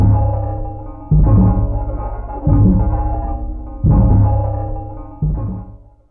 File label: Rhythm, Fairlight CMI IIe, Percussn